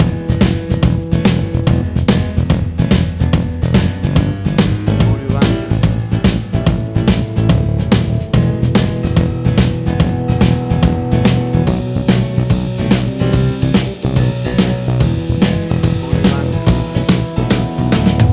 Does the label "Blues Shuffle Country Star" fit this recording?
Yes